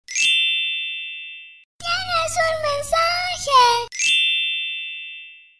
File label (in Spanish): Tienes un mensaje FX